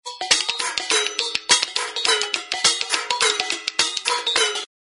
into the frying pan loop

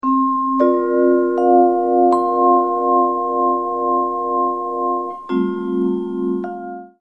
Dreamy Tone

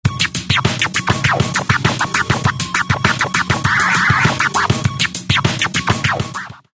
scratch freestyle

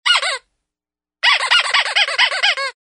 comedy laughing squeeze

